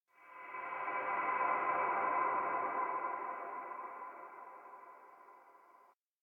elec float; aino; anemoi

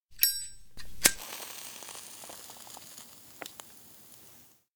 zippo; anemoi

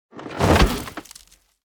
falldown; komori; anemoi

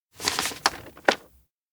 paper stack tap align; anemoi

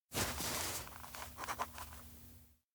peek memo; anemoi

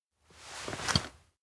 sit graceful bench; anemoi

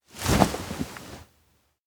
bear suit hug; awayuki

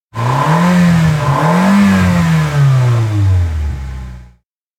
engine rev vroom; anemoi

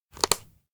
plastic break; anemoi

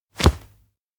hugged; koyomi; anemoi パッケージ版 1.1

